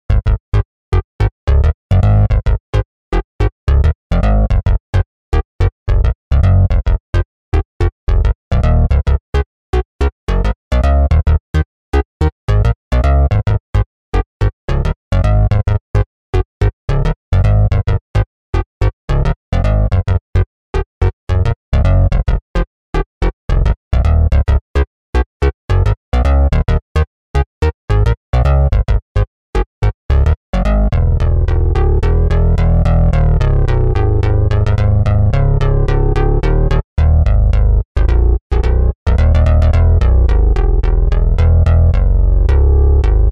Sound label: s bass; HALion6 : Synth Bass; Bigfoot Bass